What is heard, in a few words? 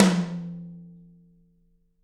TT, Toms; Bob Clearmountain Drums I